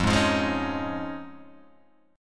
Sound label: F Minor 7th Sharp 5th, Guitar, Open G; down; chord shape